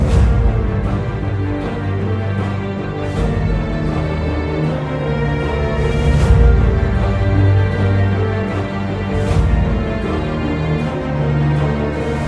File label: requiem intro loop; blockrunner